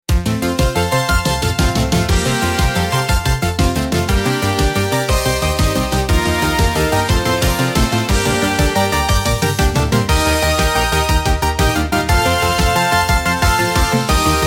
epic finale; freepixel; Emotional

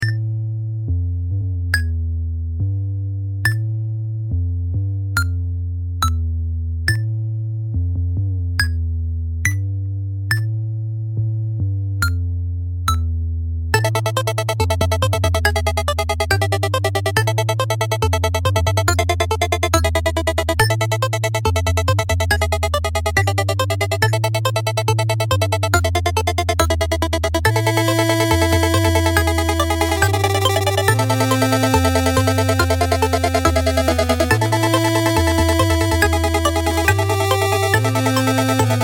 stealth mission, music; action

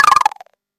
freeze solid, status effects; freepixel

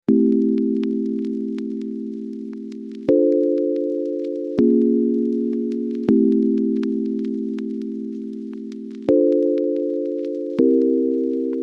lo fi chill, music; freepixel; Retro Genres

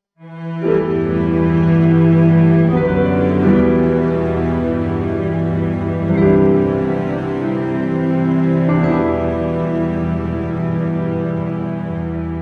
game over; freepixel; WordJourney

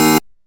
error buzz, ui menu; freepixel

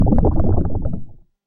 boulder roll; Environment

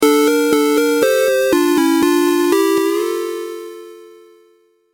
defeat jingle; Stingers; freepixel

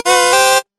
party invite; Multiplayer

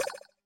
fishing catch; Crafting

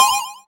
purchase; UI & Menu; freepixel

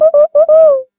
owl hoot; More Creatures

